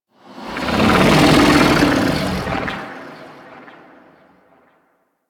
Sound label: Vocal Patrol, Enemies; Warframe; Teralyst; DE